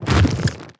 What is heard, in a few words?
step movement, zombie blind, foley; creatures